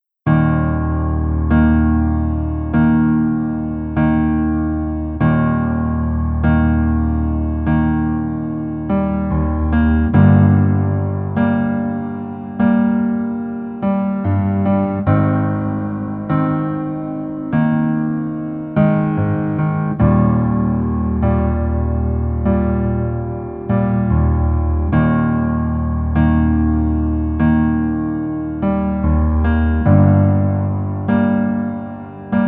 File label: Piano ver